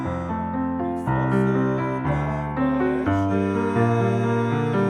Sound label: Piano L